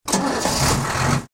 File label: Truck Engine On; UltimateFishing